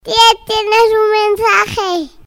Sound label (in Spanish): Mensaje